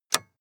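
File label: sports car interior lightswitch hard; verkehr transport; autos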